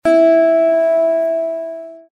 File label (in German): Zithermacher Ton H LAUTER